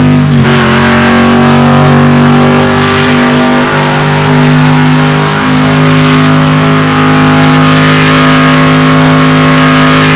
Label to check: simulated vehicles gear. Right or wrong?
Right